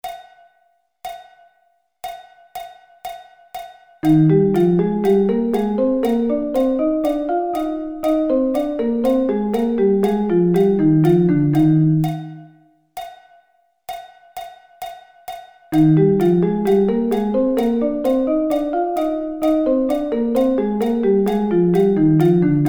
Eb Thirds

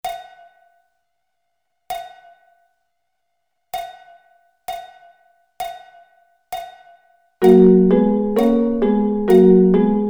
Gb Double Stops

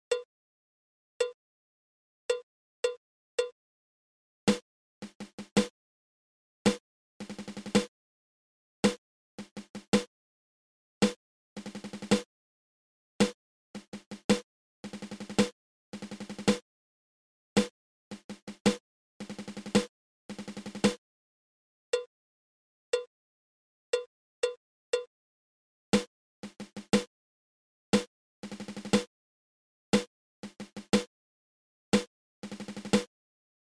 Seven Stroke Roll Triple

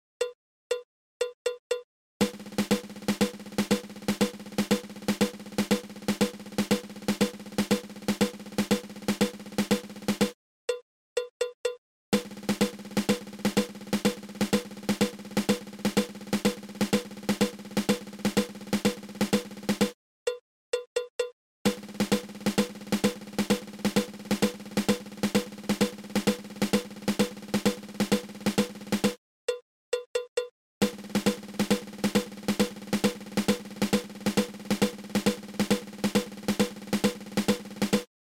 Six Stroke Roll

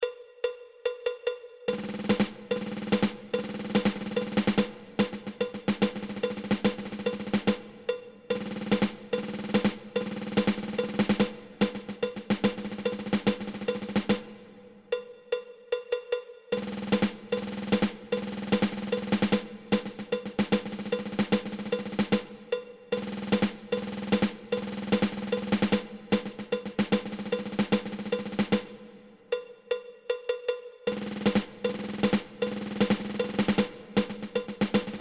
Ten Stroke Roll

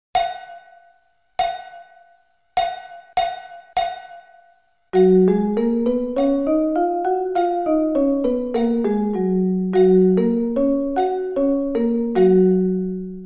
Gb Scale

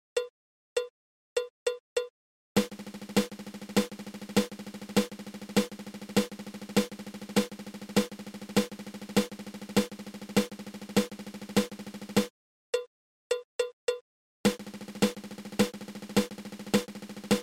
Seven Stroke Roll

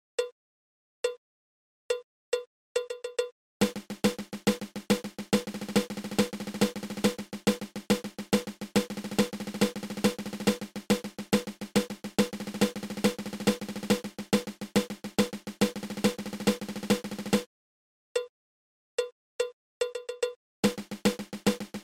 Five Stroke Roll Triple

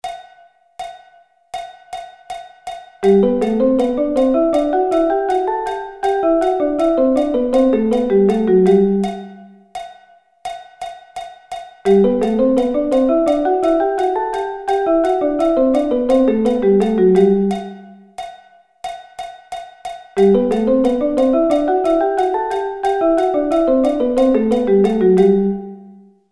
Thirds; G

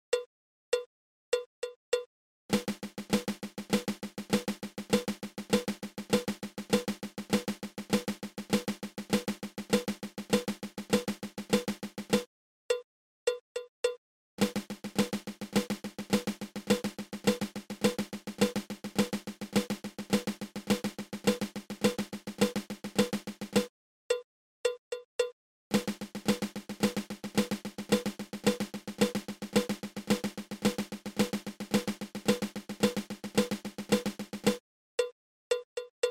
Flammed Mill

